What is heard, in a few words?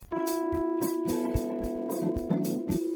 Latino